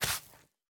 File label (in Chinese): 刷子刷沙砾声